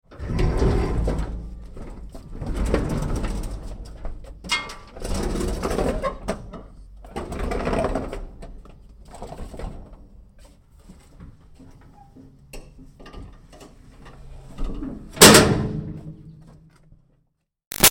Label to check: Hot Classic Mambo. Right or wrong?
Wrong